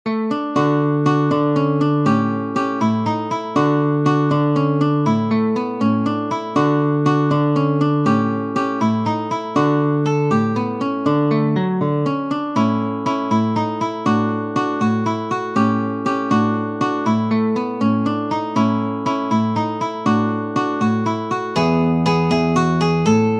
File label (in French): Poco Allegretto, guitare; Ferdinando Carulli; Op. 241 Méthode pour la guitare